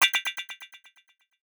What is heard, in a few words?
error